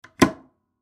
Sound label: Grandfather Clock Door Close; Machines; Foley; Blastwave